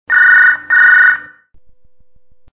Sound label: Phone Loop; android